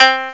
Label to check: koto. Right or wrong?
Right